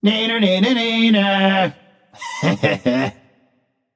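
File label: tongue, non-verbal; smite; voicepack; merlin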